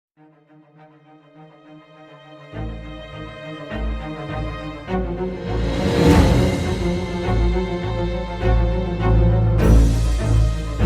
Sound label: burst of flames, music